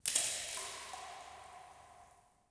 Cave Drip; water splashes

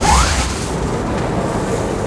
elevator hangar st, doors elevators; theed; Star Wars Obi-Wan; XBOX

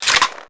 Pickup Ammo; level items; Max Payne

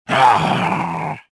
combat; reborn

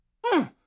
Cry HURT; TrueCrimeStreetsOfLA; XBOX

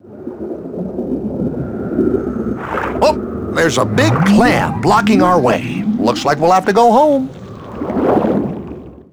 clam; XBOX; Finding Nemo; english

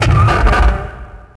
Bank vault door starts moving; Max Payne; subway

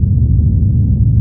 Engine Ambience Loop, Space

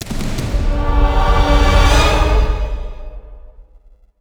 Sting

